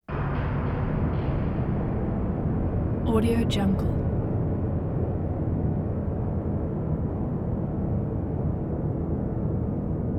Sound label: synth bang semi tonal big booms very long tails; prosoundfx